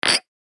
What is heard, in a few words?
Glass Clink; Bar; el colmillo de cobre